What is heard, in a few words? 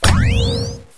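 plasma on, weapons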